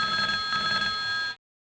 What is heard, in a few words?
Phone Ring Ring